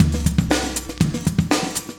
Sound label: chique 120, loops, 120bpm; Sound Effect Library